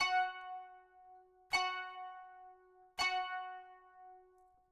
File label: kanun